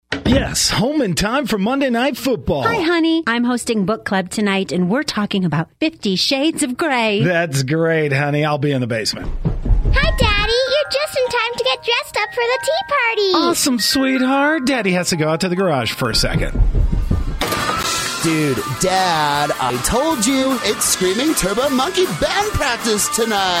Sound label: Laugh; Hickory House